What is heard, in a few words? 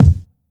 kickdrum sound F sharp key, Royality free kick single hit tuned to the F# note. Loudest frequency: 169Hz